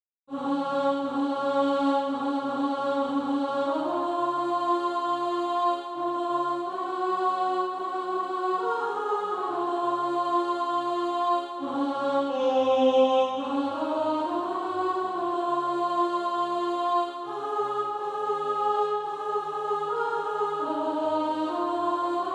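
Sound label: alto, Practise Music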